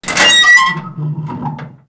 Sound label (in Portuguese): portao